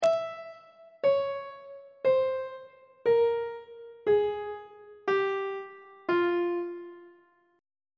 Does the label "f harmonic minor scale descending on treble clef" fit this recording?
Yes